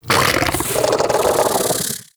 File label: InfKDriveBug Vocal Mount; Warframe; Hunting Critters